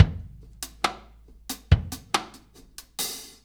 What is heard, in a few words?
Earth Loop, Drum Tools, Laid Back